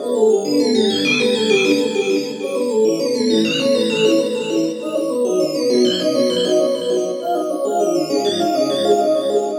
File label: Synth Arpeggio, Synths; Eclectic Electronica